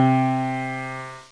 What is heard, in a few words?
piano soft; piano 2 soft; instruments various